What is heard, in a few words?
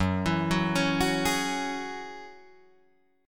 Gb 7th Suspended 2nd Gb Guitar Drop D